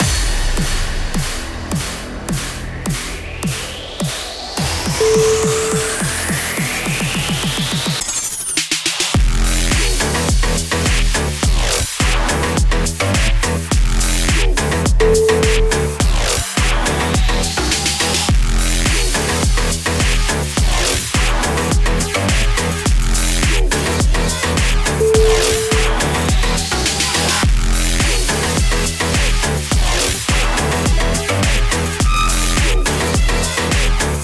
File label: bass alive